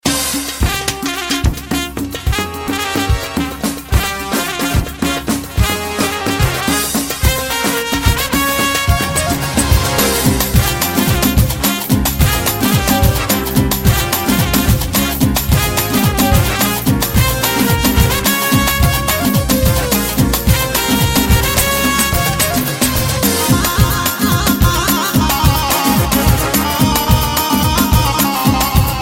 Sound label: Trumpet rai